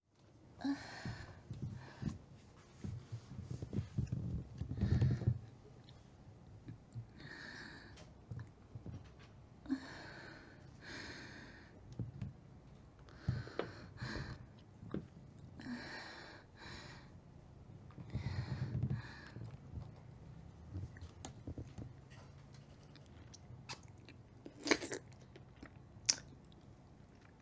人妻, ガチのフェラチオボイス; チンポをしゃぶりつくす

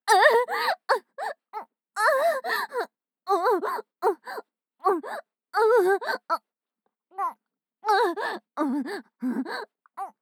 騎乗位, ASMR

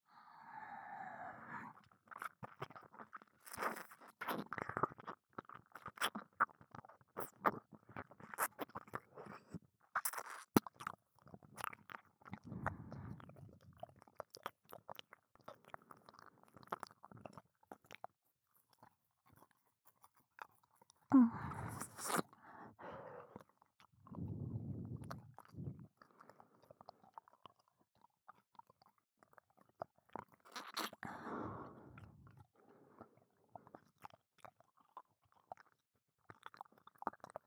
左耳舐め, ASMR

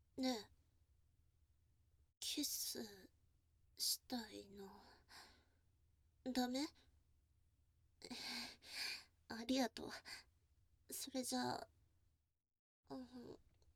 キス

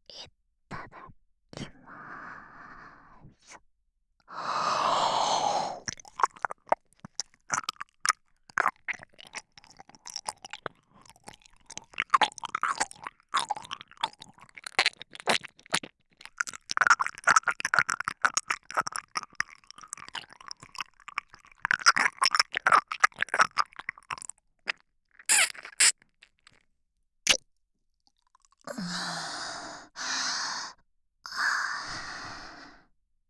耳舐め, ASMR; SEなし

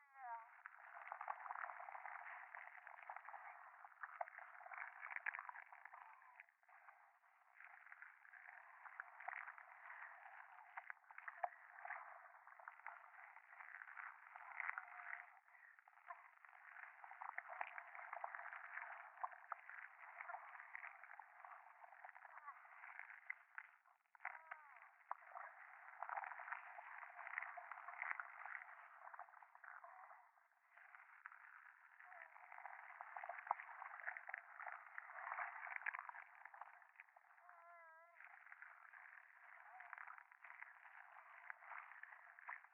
電話 フェラチオ 触手

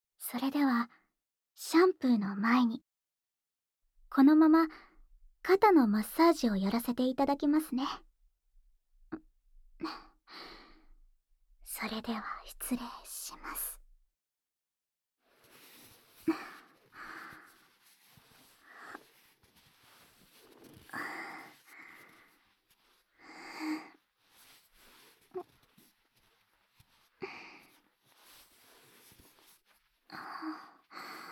肩のマッサージ＆肩叩き

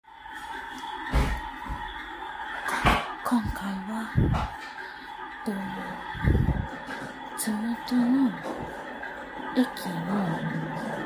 駅のトイレでオシッコ; 某駅のトイレ

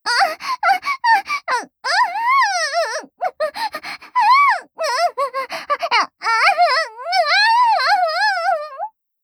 喘ぎ大

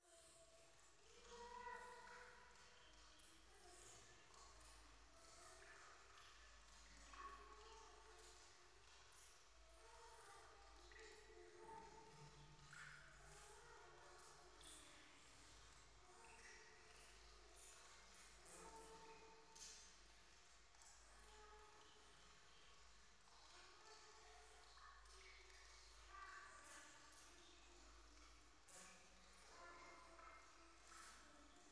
イラマチオ 雨上がり; 夢の中

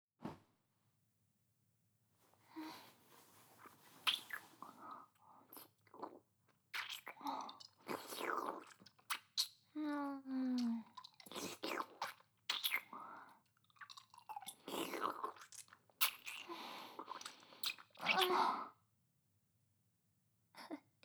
キス; 押し倒してからの、ファーストでディープなキス; 美波

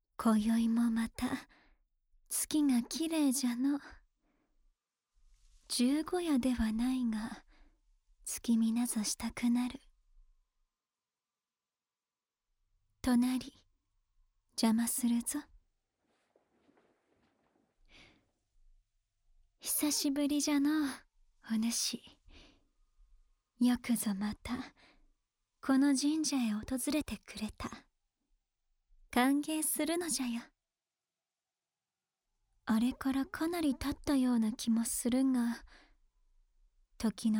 安眠ボイス＆寝息, ASMR

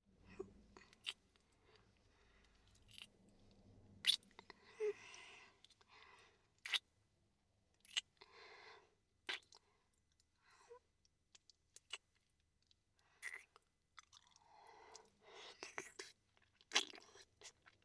キス; SEあり

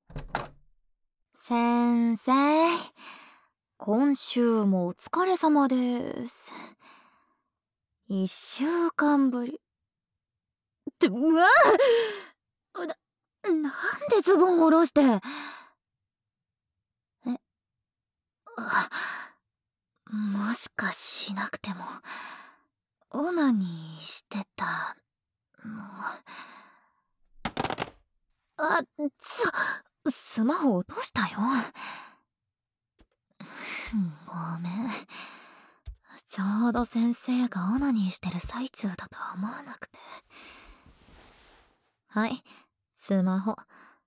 フェラチオ, ASMR; えっちな自撮り写真を見ながらレイヤー本人にフェラチオ♡「人気レイヤーのお口に射精していーよ…♡」

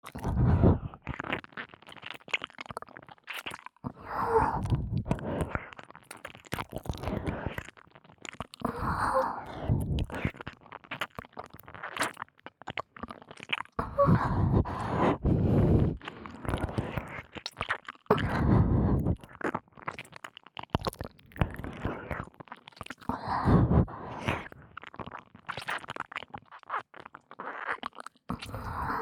ねっとり しゃぶりつき 耳舐め; KU100; くちゅ系効果音版

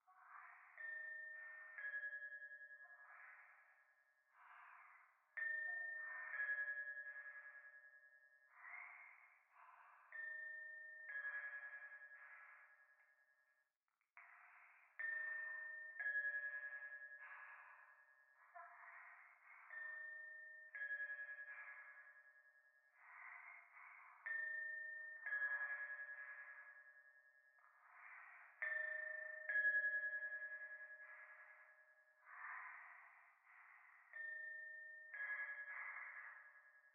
教会 右耳密着吐息 神社

